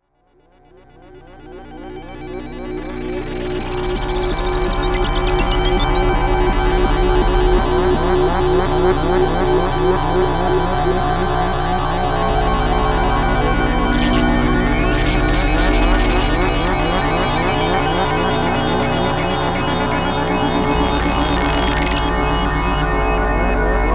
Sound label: Pulse Emitter; anarchymoon